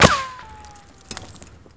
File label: wall; Mafie 1